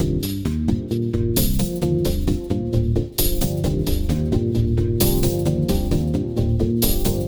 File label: Funky 125-132 Cm7